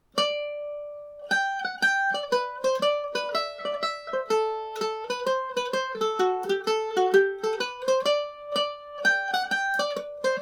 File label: hornpipe, irish mandolin; kellys